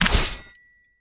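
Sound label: attack brandish sabre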